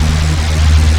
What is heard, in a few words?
hero alien drone ray loop